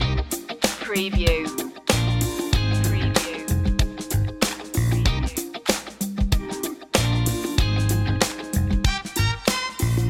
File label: forever funk loop